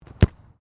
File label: SOCCER BALL KICK FROM GROUND; Soccer ball sounds; Sports